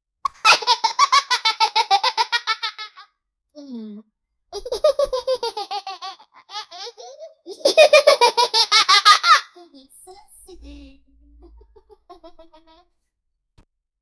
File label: kid alone playing with a, ball